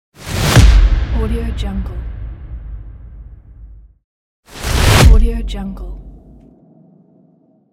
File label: woosh hit; phillipmariani